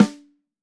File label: Snare FX, drums; One Shots; MPL